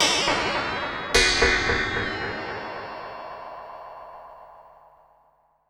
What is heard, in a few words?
Ring Mod & Delay, contact mic Cymbal